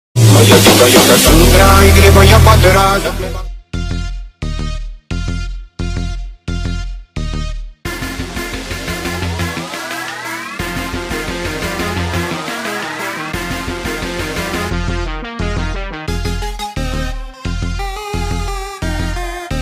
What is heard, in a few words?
attitude incoming call ringtone